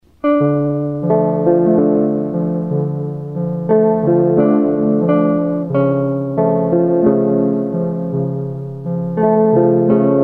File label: 3 guitars